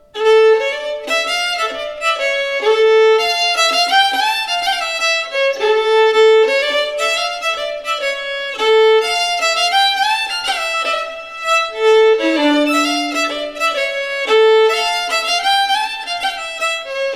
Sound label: Polska; Polska efter Lissmyr Erik